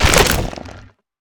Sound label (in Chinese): 嘎枝攻击声